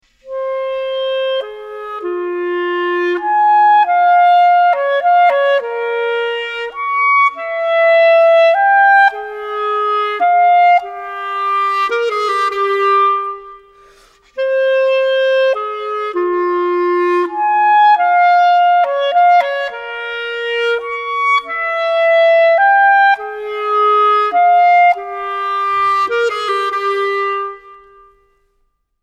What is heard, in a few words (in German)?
klarinette